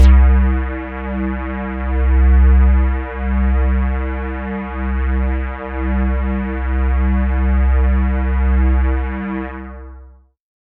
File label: PAD C2, Roland MKS-80 synth pad; Pads